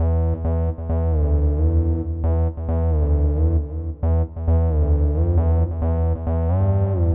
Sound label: synth, Hardcore Breakbeat, 134 Emin; Big Fish Audio; Hardcore Breakbeat Action; Mellow Jack